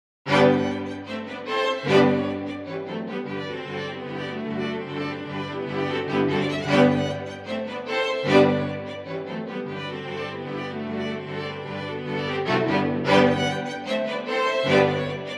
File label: Russian Dance; The Nutcracker (suite), Op.71a; Tchaikovsky, Pyotr